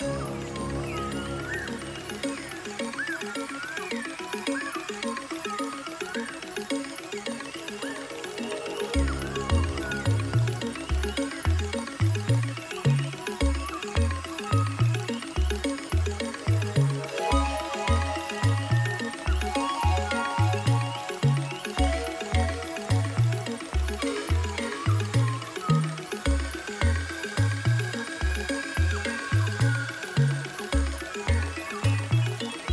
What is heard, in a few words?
ambient